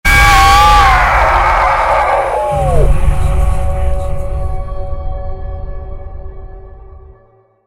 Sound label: jump scare; anna